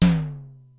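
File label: Simmons SDS-9 high tom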